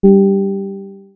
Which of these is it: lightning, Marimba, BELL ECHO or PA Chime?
PA Chime